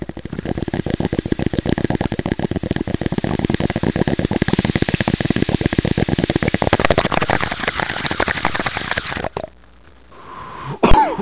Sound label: bong